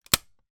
office staple gun stapler stapling into wall

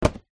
game box down on table card; zapsplat

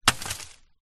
impact stick branch land on forest floor leaves

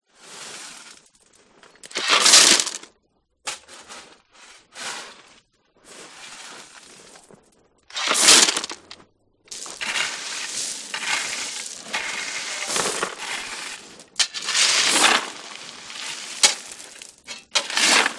Shovel Gravel

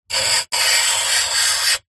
metal scrape sharp; foley

